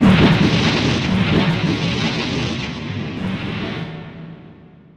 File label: Whirling Axes (Melee)